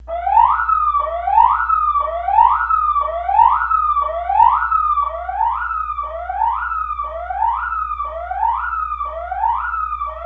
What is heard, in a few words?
Siren Wail